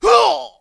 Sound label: death; mohaa; dialogue